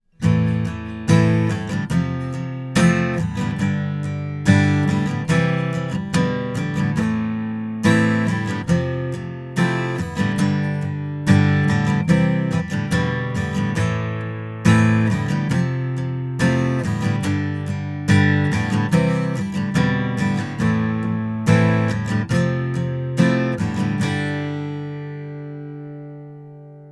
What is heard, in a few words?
sputnik rythm; m-audio sputnik